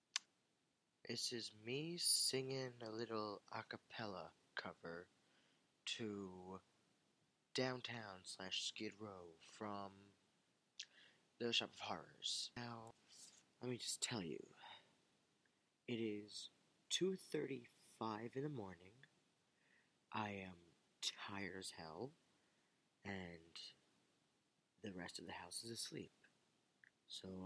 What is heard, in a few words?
terrible singing